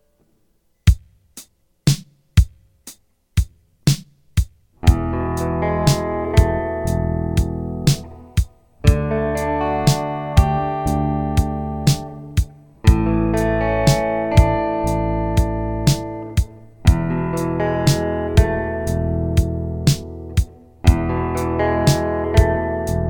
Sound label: E D A E Sus 4